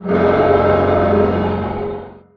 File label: damage; Barotrauma; Barotraumatic; Carrier